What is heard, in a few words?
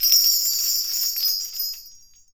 BELLTREE